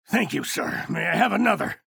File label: pain ability damage; ranger; low health